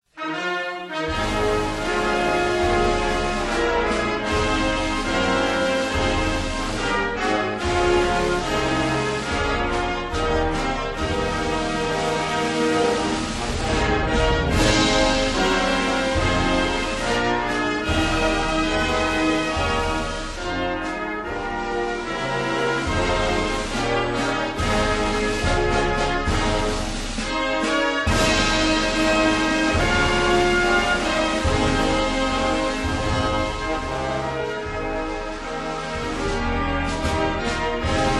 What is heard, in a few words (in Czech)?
hymna Vietnamu instrumentalni